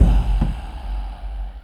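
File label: Music Shield; from dust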